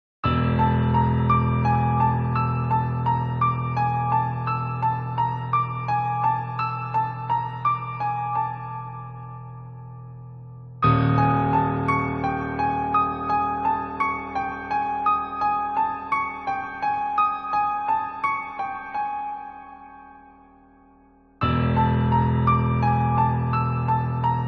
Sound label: Horror loop; Your Turn to Die; Horror-ginen